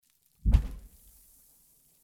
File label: Explosion